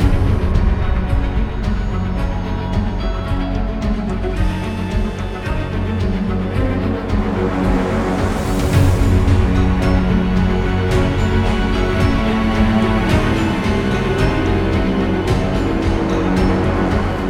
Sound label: hard battle; Magic GameClient